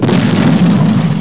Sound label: BOOM